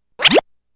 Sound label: Cartoon Effeckt; Ringtones